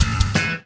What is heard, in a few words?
SMS